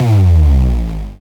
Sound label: boom; osu